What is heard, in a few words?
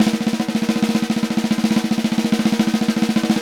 Vintage Snare Drum; Q Up Arts; Johnny.C's Vintage Snare Drum